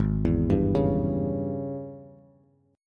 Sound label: Ab Major 7th Suspended 4th Sharp 5th Ab down Bass; arpeggiated; JGuitar